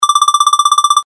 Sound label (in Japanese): 会話